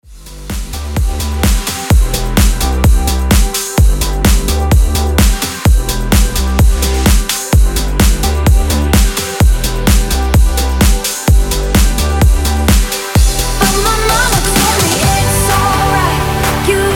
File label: edm breaker 128 bpm; remixplanet